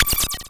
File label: Cwejman S1 Shatter Kit